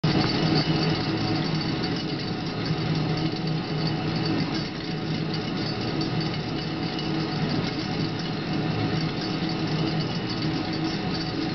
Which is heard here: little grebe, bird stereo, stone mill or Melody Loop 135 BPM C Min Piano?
stone mill